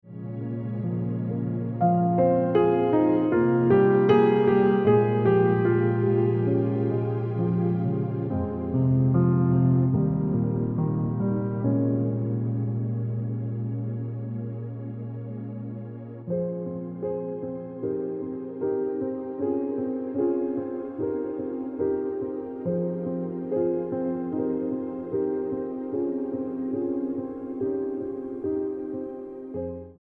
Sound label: Piano Music